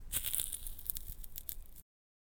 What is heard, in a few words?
Cigarette Int Putting Out Cigarette